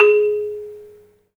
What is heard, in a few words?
MARM, 3D MARIMBA; 3D Sound; 3D Keys VOL-1